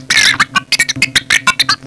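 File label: mad computer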